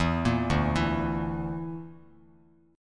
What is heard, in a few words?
D Suspended 2nd Sharp 5th D, Bass Standard; Dsus2#5; arpeggiated; JGuitar